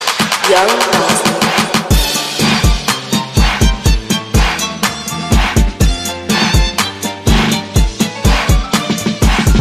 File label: trap remix